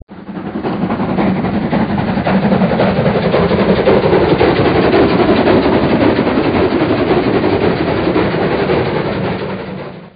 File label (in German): Lok Vorbeifahrt, modellbahn